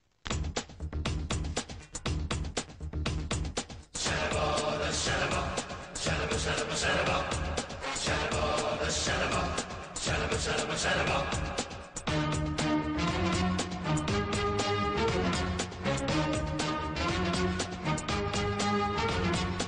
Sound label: ringtone bgm; chenna kesava reddy; bala krishn